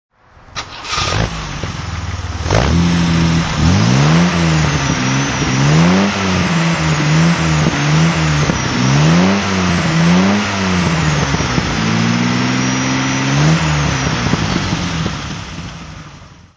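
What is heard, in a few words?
mg mgc throttle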